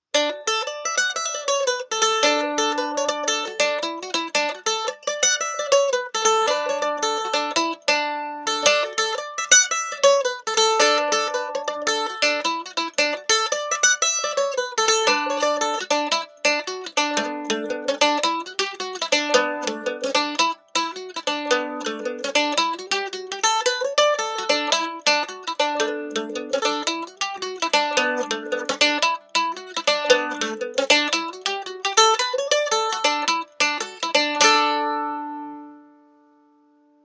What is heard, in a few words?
Mandolin Pick Comparison